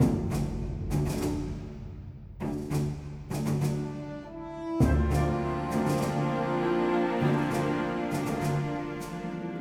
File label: prebattle big military; Shadowhand; macos windows gamerip 2017